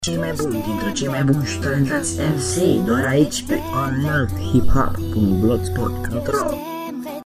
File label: spot hiphop